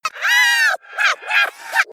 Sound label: threatening laugh; kzdokuroku